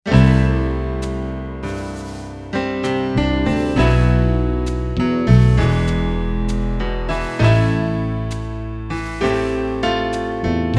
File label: jazz, jazz fusion